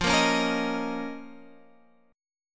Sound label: F 7th Suspended 4th Sharp 5th F; F7sus4#5 Chord {13 13 11 X 11 11} strummed